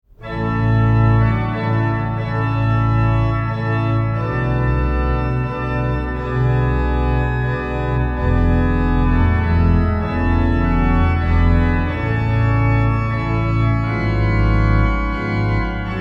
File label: praeludium b flat, organist